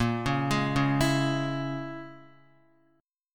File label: A sharp Minor 7th Flat 5th A sharp, Guitar 4F,4A,5C,5G,5C,6E; arpeggiated; x,1,1,1,1,0